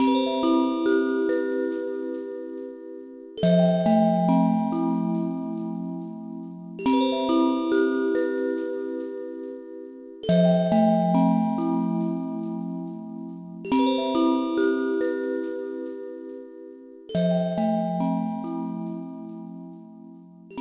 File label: TV Commercial; animal crossing new leaf welcome aamiibo soundtrack; Snowman TV